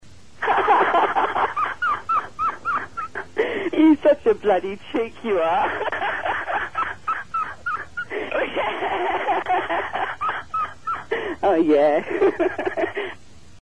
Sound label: laughing woman